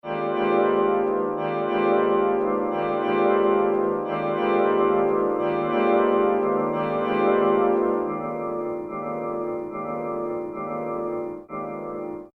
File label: piano paste